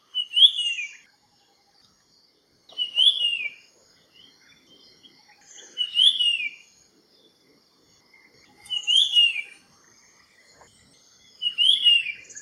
Puff throated Babbler calls, Ulu Kinta Reserve Ipoh Perak Malaysia